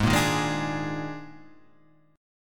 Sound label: D sharp Major 7th Suspended 4th G sharp